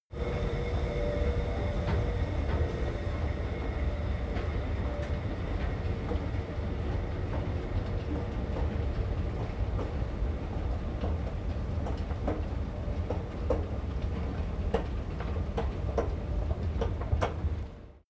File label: escalator take downstairs